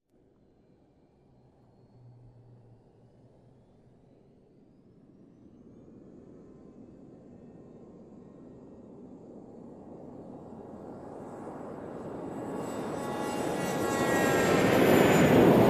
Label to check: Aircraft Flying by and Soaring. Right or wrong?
Right